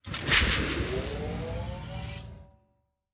Door, Sci-Fi Sounds

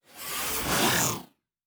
Synth Whoosh, Sci-Fi Sounds Movement

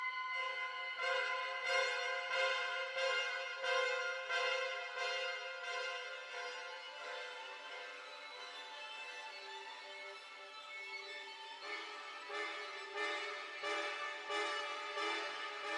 Suspense, Loop, 90bpm, Horror Sci-Fi Music; Dead Ark

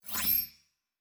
Device Start, Electric

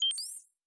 Additional Weapon Sounds

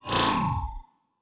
Teleport, Sci-Fi Sounds

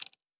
Metal Tools; Sci-Fi Sounds

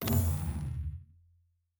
Shield Device Start, Electric; Sci-Fi Sounds

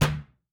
Metal Box Impact; Sci-Fi Sounds

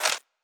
Weapon Foley (Laser); Sci-Fi Sounds

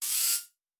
servo small, sci-fi sounds, mechanical

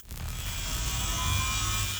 Weapon Load (Laser); Sci-Fi Sounds

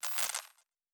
Device Stop, Electric

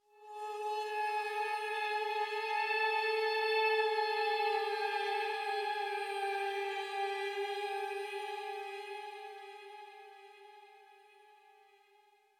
Suspense Stinger, Horror Sci-Fi Music; Dead Ark

